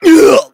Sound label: heavy pain sharp